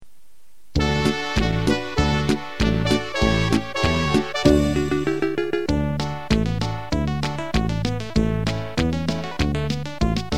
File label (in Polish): wersja instrumentalna